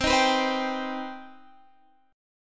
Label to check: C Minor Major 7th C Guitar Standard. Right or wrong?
Right